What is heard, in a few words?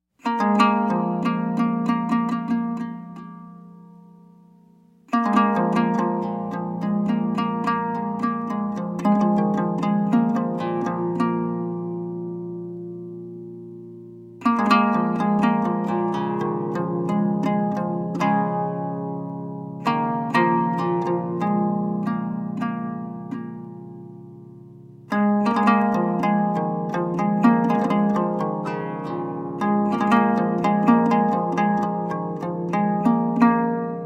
improvisation for Anglo-Saxon lyre